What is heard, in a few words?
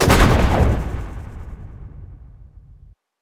conjure fire, conjuration; skyrim